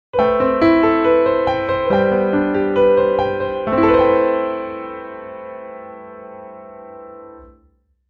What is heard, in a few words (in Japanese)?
ジングル; 悲しさの中に